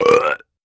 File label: Belch Shrt; belching